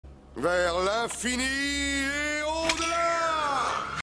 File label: Buzz